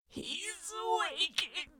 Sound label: hes waking; devour 2021 gamerip pc macos